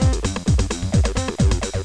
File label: loop; DirecPC